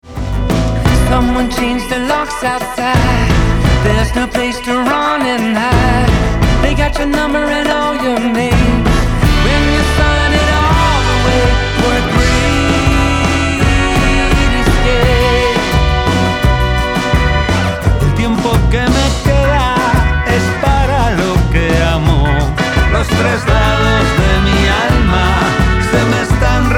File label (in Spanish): Cumbia Peninsula